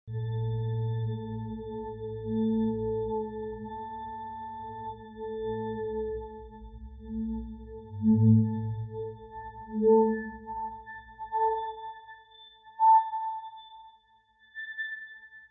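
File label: church organ filtered A sharp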